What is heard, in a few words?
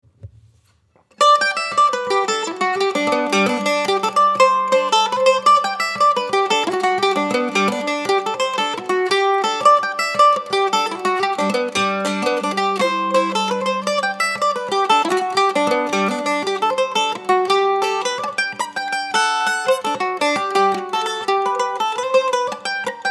mandolin